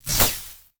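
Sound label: strong spell magic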